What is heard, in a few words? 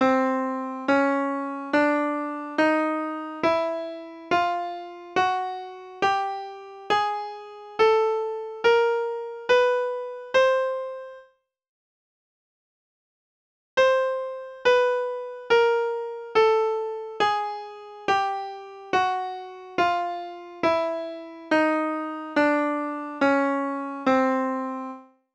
Chromatic up and down; First Steps